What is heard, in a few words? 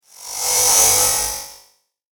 Electric Ice Whoosh; Whoosh Sound Effects; Andrew Fleming